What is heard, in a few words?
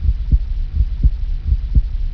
heartbeat; cstrike